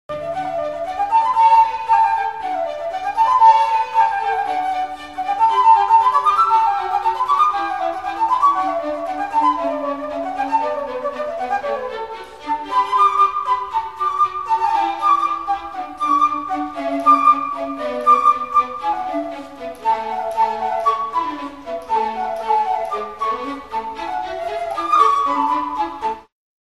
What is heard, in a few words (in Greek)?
Φλάουτο; Concerto in D